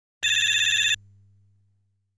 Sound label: Boss Fires a Lazer